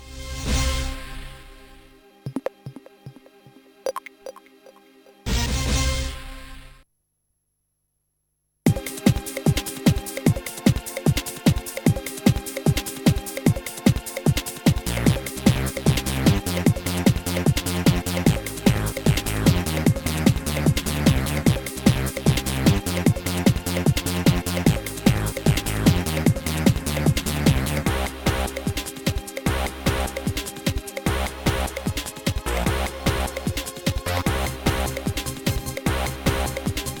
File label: intro beat